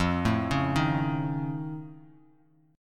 F Augmented 7th F, Bass Standard; arpeggiated; F+7

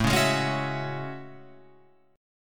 A Major 13th, down Guitar Open F; AM13; strummed; JGuitar

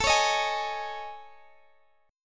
A Major 7th Flat 5th, Guitar, Open G; chord

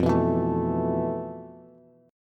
F Major 7th Flat 5th Bass; strummed; JGuitar

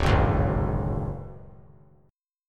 D Major 7th Flat 5th D down 3C 3F 3A 3D, chord; strummed; JGuitar

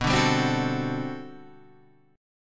G Major 11th B

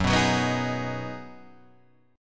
B 6th F sharp, guitar chord

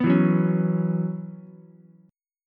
Gb 7th Suspended 4th Gb, Ukulele; strummed; 4,4,2,4; JGuitar

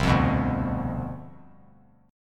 C Major 7th Suspended 2nd Suspended 4th, chord; strummed; CM7sus2sus4; 12,12,14,12

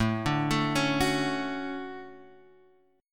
D Major 7th, chord

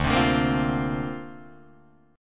D-Minor 7th Sharp 5th, D, Guitar, Drop D

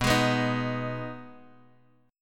B 6th B down Guitar DADGAD

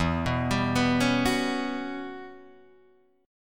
E Minor 6th Add 9th, Guitar, DADGAD

